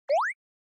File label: Anime Motion Single